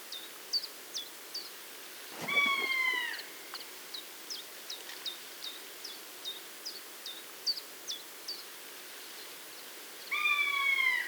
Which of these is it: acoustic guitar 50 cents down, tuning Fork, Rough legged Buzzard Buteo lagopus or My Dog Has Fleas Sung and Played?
Rough legged Buzzard Buteo lagopus